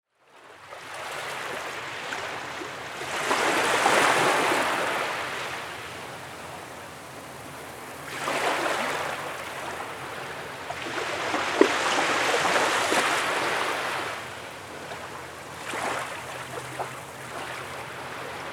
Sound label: Nature